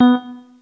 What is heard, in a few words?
Synth